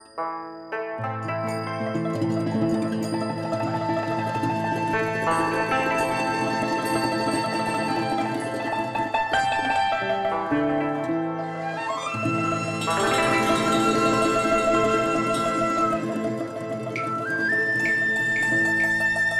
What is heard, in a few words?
TRADITIONAL Chinese, Picking Flowers